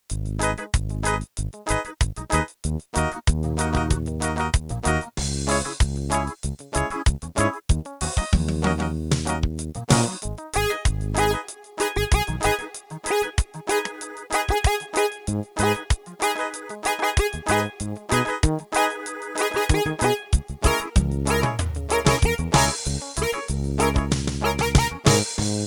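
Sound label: Eb Stick version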